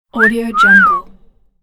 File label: tonal mouth whistle cartoon wolf whistle; سوت تعجب